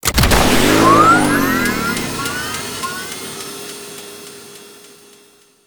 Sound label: Skiff Engine Boost Medium, Vehicles; Warframe; Grineer Skiff Player Vehicle